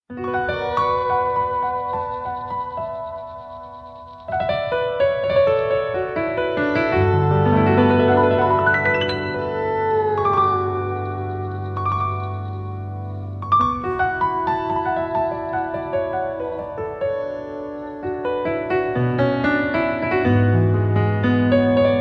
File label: instrumental